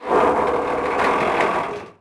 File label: tram cables sqeuak